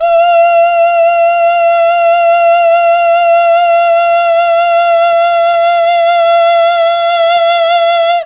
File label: F4 CHAMB MAL, Mellotron, MALE VC; Mike Pinder - Mellotron Archive CD; CHMB MALE VC